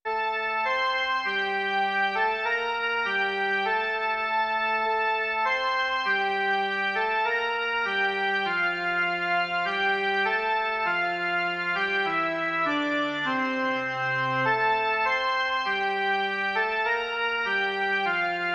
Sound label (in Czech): jen melodie; Bože, jenžs dal život nám